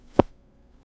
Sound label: soft card flip